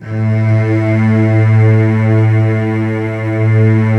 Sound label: A1 low, lush strings; InVision Interactive; Lightware VOL-1; Instruments & Percussions